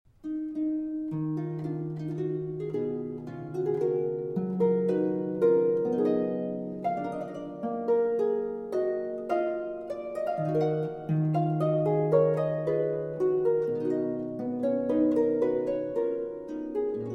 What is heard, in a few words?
Lute Suite in E Minor Courante; BWV 996; J.S. Bach